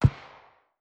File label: Handgun Shot; liblast; Weapons